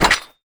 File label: UI Char Set